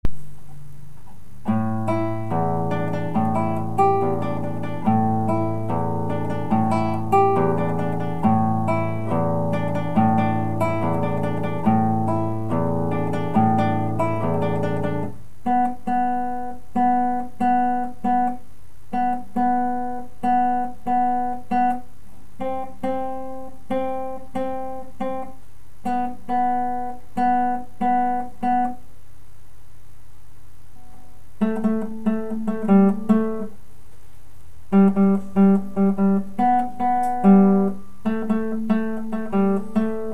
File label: kalimba